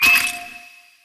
respin block break fast; medallion Desktop; Fantasma

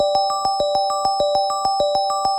Credit Loop; Mirror Magic; genesis

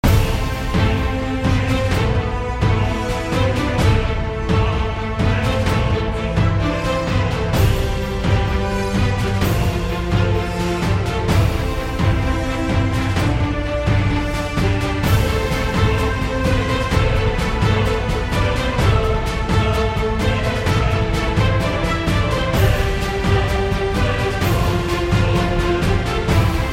Free Spin Music; Fire Wizard; Axial

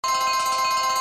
Counter Big Win Loop; fire Wizard; Axial

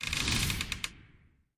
crossbow appears; Skillzgaming; battle Mania Desktop

